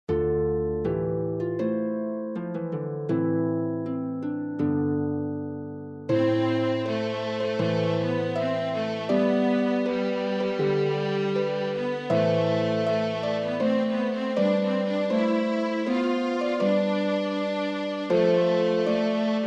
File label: Processional, Harp and Cello; Processional # 8